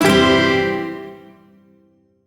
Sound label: Shawzin Pent Maj Chord; Music; Warframe; Duviri; Chords